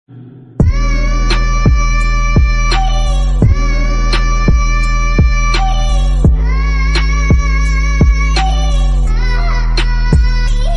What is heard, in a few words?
Sad Music Theme Ringtone